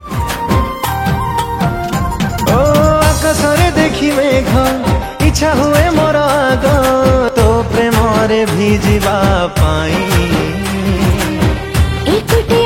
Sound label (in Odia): Odia Romantic Song